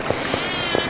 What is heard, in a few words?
squeak, orca